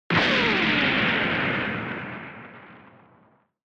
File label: Retro Anime Gun Long